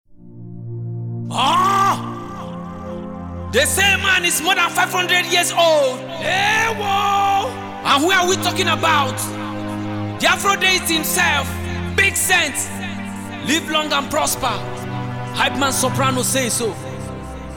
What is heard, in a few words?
Afro Hype